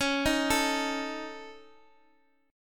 C sharp Suspended 2nd Sharp 5th C sharp Guitar Open D, chord